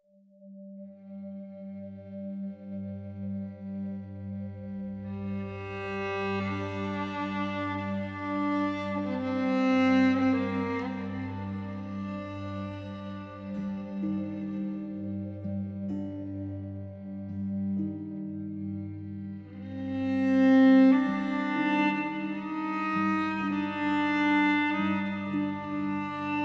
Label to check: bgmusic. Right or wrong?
Right